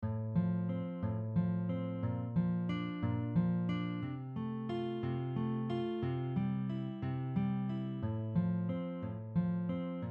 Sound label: Spread triad, guitar